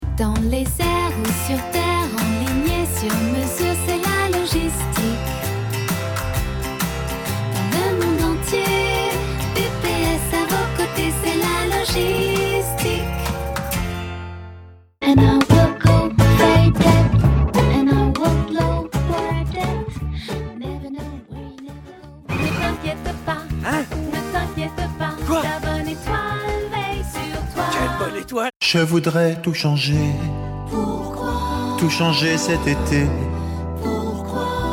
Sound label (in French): Medley chant